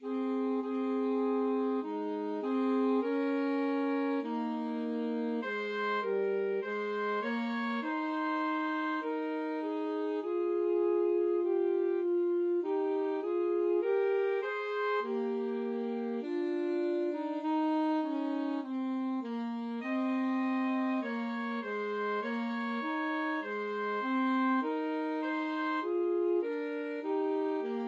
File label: o come Alto Saxophone duet